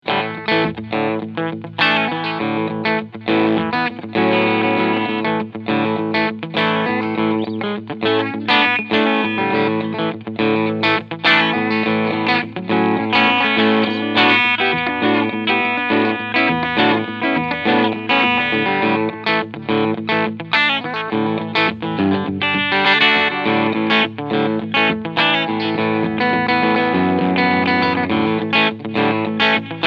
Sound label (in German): GL Tribute Asat Classic Bluesboy E Gitarre Steg Pickup Clean